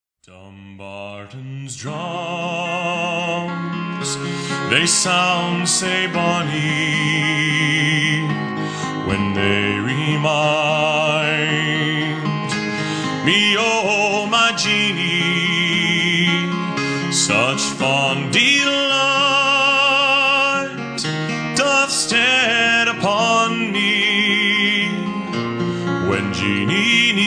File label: Dumbarton's Drums; Ye'll Tak The High Road